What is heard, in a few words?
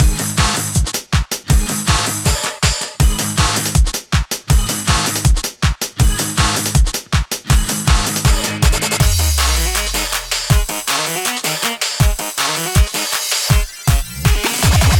Kart Racing Nuclear Plant Mix Short Intro